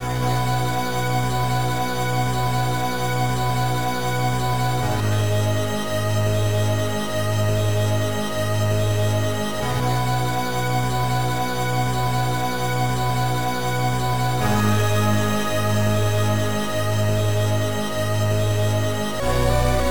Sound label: Farm Ambient; Perihelion The Prophecy; Amiga